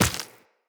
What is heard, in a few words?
Step Flesh, Footsteps Walk; Tenno